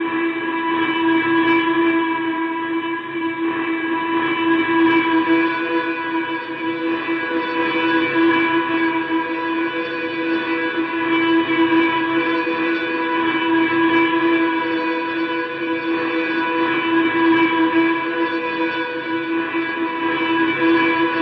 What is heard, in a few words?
Ambient